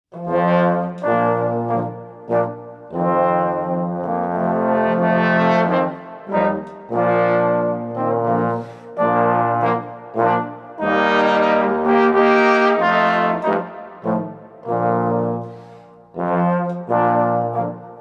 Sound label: Landler; Staade Landler